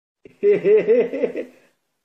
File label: hehehehe; made with Voicemod